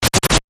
Short Stutter

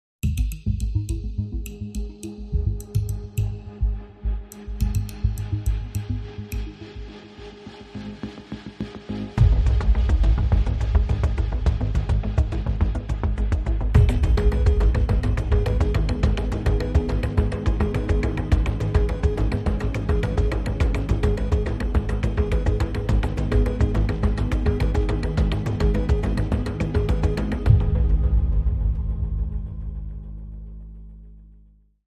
No Piano

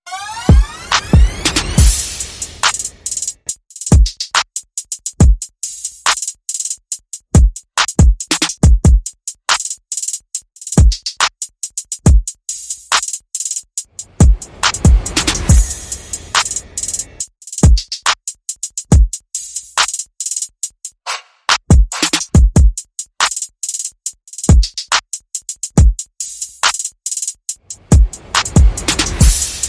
Perc and FX Only

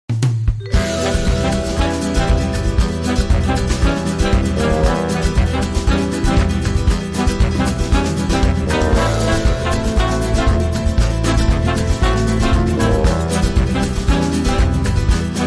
Nice Samba

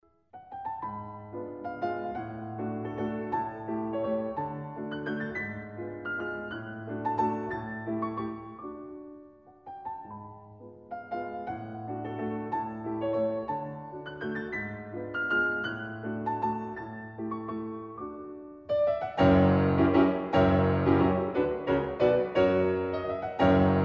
German Dances; Franz Schubert

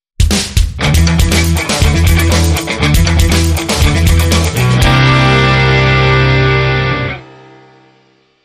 Power Chords

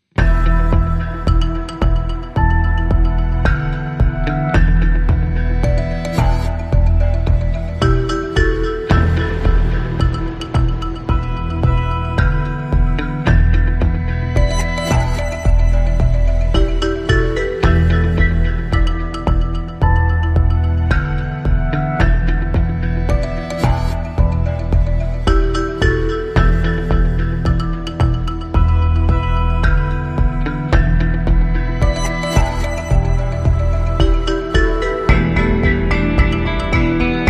Less Guitars

